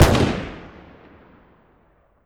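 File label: weapon ar fire player atmo exterior; gmod; viper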